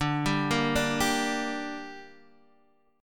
D Suspended 4th Sharp 5th D, down, Guitar Half Step Down